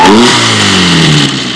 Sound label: V6 REV; trasporti; auto